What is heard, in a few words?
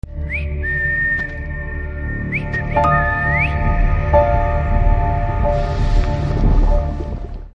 Penguin Bird Sound BGM Ringtone